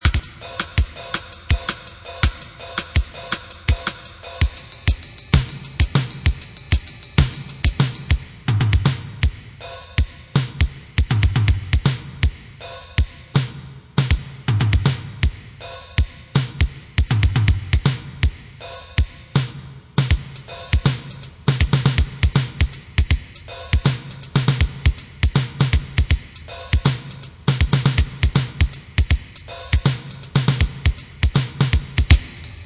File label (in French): Technique ternaire, batterie; Cours de batterie; Exercices